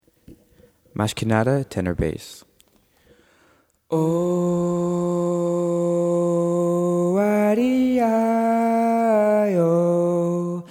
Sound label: tenor bass